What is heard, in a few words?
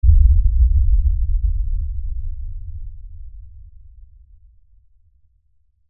LFE Explosion, Lfe Explosion Low Bass Blast Rumble; Blastwave